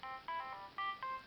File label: motorola razr ringtones fanfare